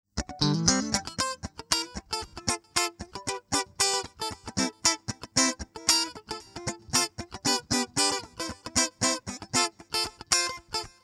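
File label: Funk rhythm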